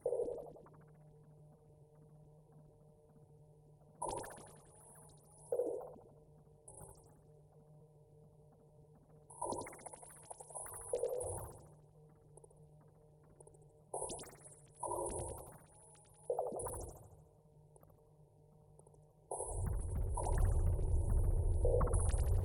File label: sand machine